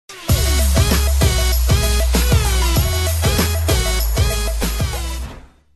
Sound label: victory; Cats Cosplay; Android